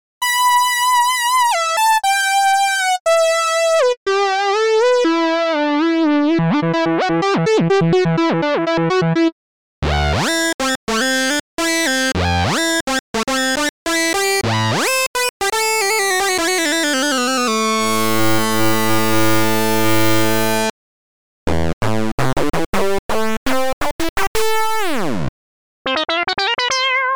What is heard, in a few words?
leads; TN6-2024-replicants